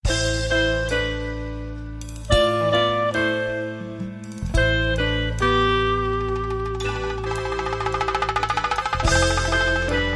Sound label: Latin Dream